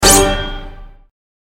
combo; slice fruit

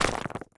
Boulder; johnny upgrade

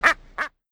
duck fall; tap tap dash

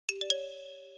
wrong; hangman

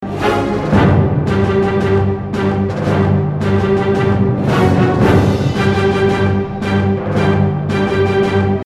main loop; air fight

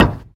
collide pipe; ball rolling game

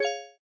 button; traffic command

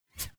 fire; paint gun3

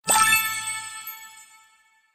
correct; hangman

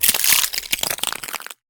ice; slice fruit

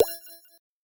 button; space tunnel

